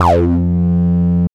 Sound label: 303 F2; Jack Sparrow; Deep Dubstep Progressions